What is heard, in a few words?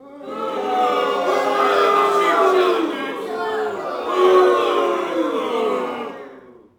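boxing crowd small boo; SFX Kit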